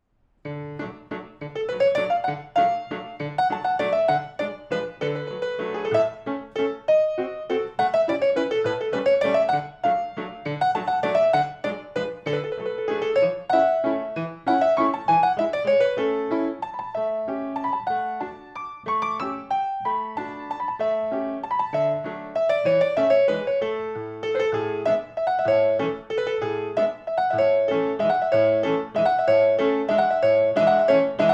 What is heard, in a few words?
Pas de Six 2nd male variation; Napoli